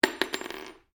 Cartridge 9mm Win Mag impact polished wood, shell casings; shell casings of different calibers impacting on different surfaces; Pistol